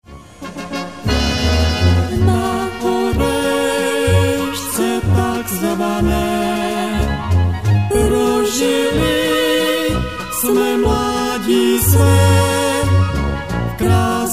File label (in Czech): valcik